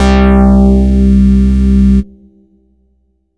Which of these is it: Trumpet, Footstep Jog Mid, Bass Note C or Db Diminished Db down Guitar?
Bass Note C